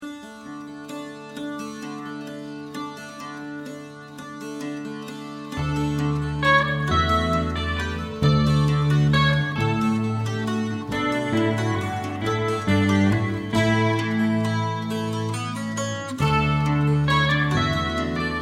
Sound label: Waltz